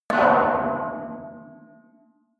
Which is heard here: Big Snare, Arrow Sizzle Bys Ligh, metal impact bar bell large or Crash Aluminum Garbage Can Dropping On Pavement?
metal impact bar bell large